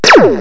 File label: wiimote meow